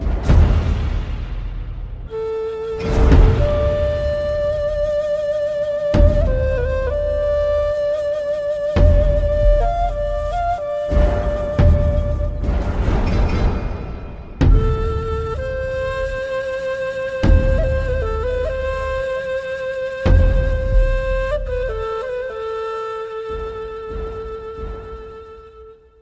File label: Ney